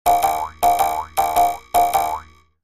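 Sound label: Boings With Twang Boings; boings / twangs / snaps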